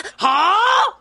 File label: rin hUH